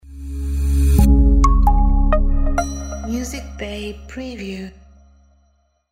Minimal Logo

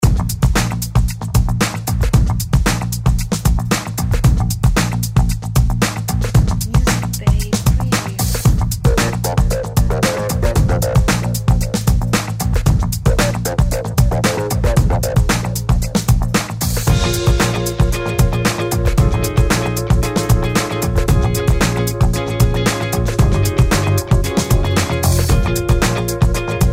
Breakbeat Loop

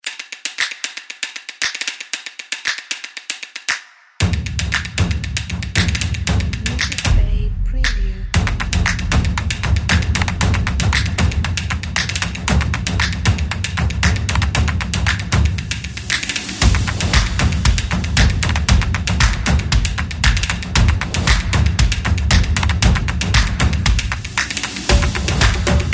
Beat

